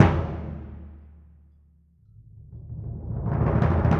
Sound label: Bass Drum, Orchestral Series Rock and Pop